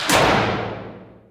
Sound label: metal stop, doors; Source Engine; video game